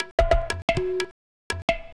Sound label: Tabla